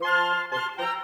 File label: Winds, Orchestral; Sonic Foundry (Sony Creative Software); Orchestral VOL-2; Modern 31